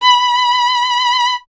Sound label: STR VLN, Vln Detache; Miroslav Vitous